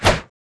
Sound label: SWORD